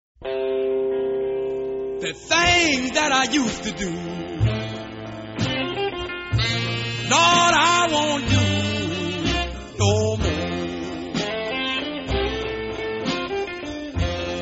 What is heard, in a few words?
guitar